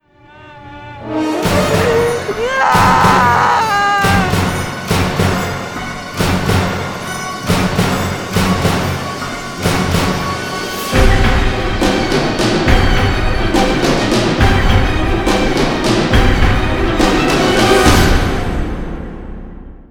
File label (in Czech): horor